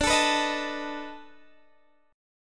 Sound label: B 7th D; B7/D; strummed